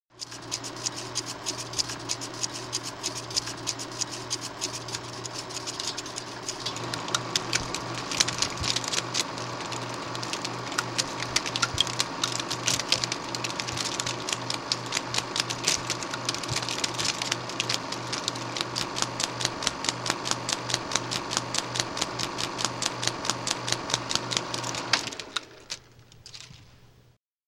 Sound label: turning off projector